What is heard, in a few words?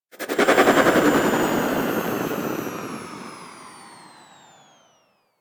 Archwing Movement Slow Wind Down; Warframe